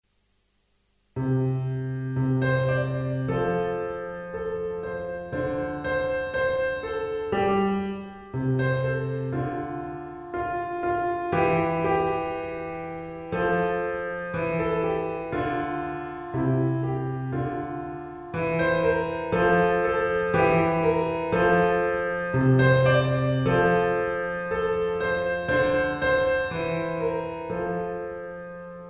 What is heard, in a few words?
piano pop